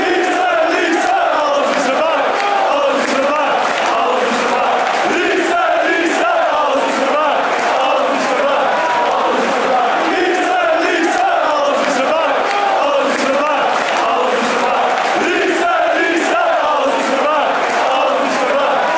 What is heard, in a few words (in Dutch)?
lierse alles is voorbij